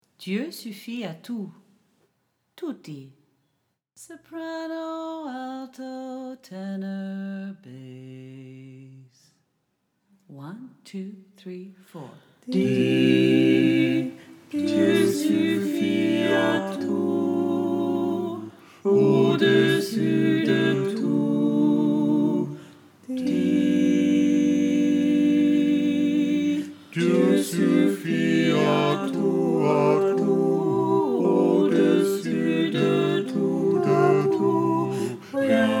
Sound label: tutti